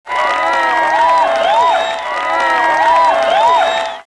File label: handclap; Character